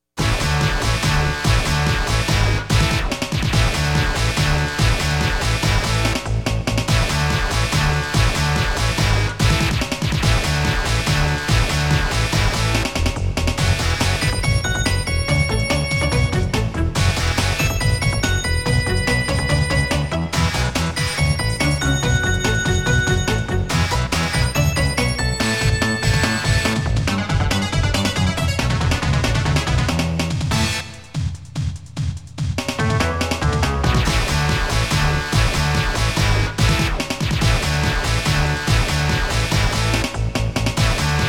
Bonus Stage

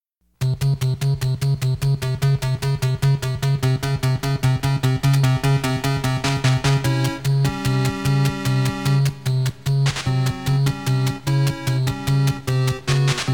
BGM

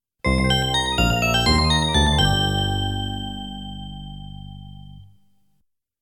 Game Over

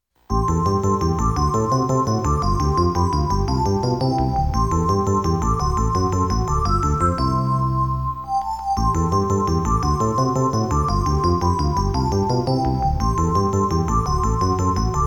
Game Over

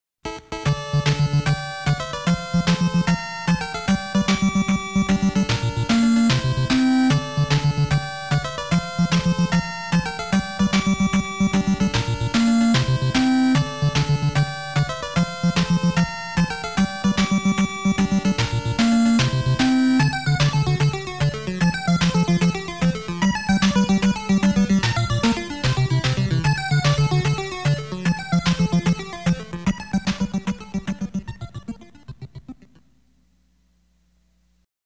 Start BGM

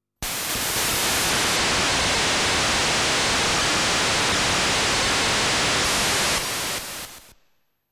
Water Pulling SFX

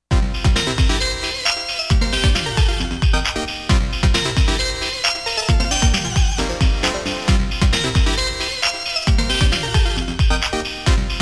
Transformation BGM

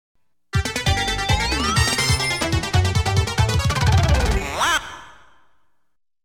Falldown

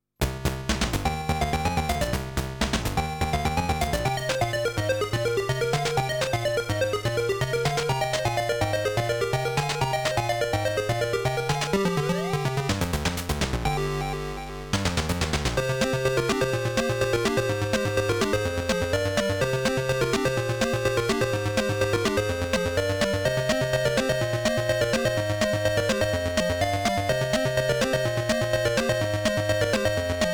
Tension; Music from Ys

